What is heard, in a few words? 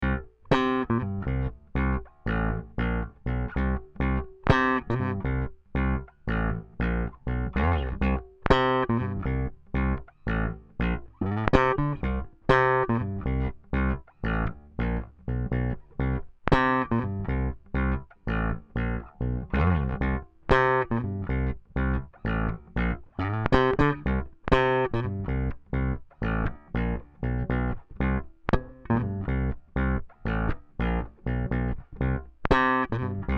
Tight Funky Foot; admiralbob77